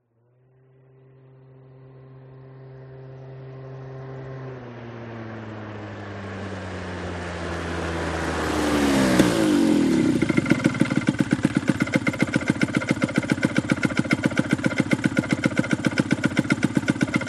PIAGGIO ZIP 49cc External Approach and Shutdown Idle Fast Close Following